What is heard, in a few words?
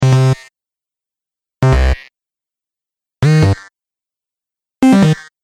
Error Notice Sounds, dong